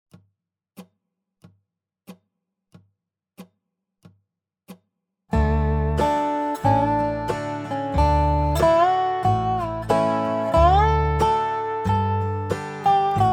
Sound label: basic melody Dobro with backing track; Hark the Herald Angels Sing; Holiday 2025 Dobro Zoom Workshops